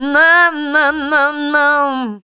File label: Dance Vocals, FEMALE